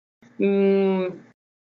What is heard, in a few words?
mmmmmm